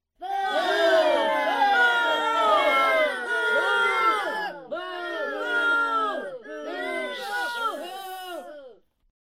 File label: boo